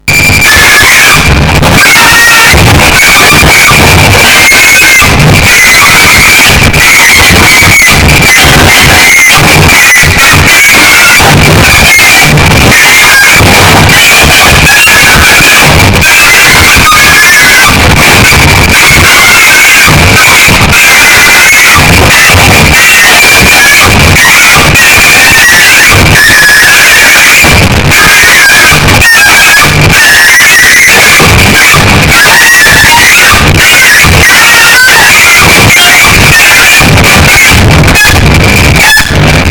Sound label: Goosli Noise